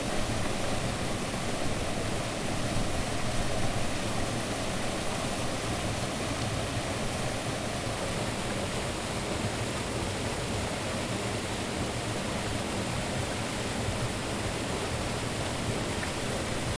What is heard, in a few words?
WaterFall